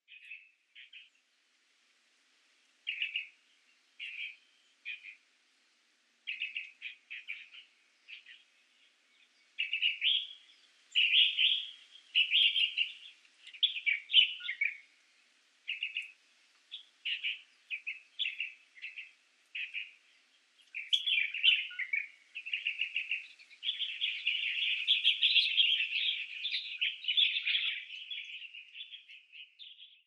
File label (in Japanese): shirogashira, bird-research; honkon